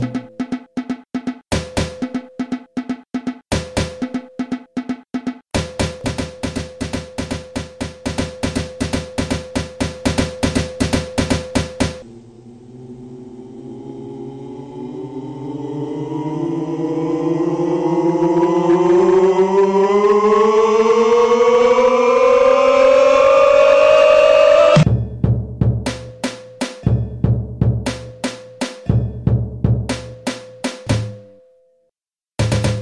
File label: elephant break